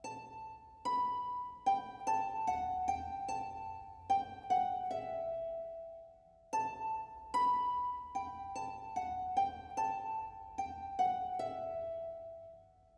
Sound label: melody c needolin loop; Hollow Knight: Silksong